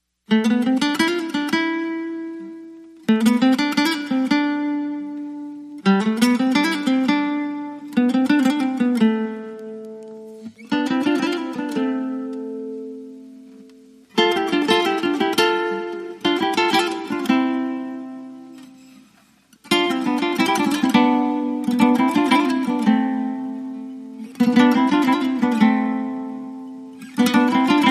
Mediterranean Blue, Guitar Only

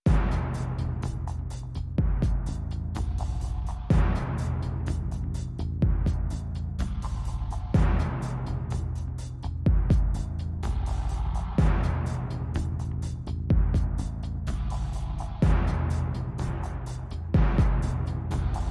Drums Only

